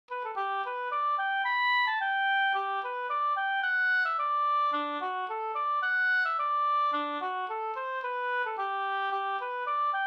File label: polka